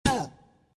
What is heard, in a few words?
Pain hit bear; iStunt 2